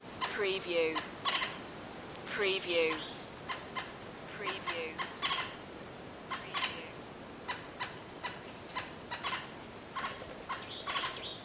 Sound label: extreme bird chirp